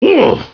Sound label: pain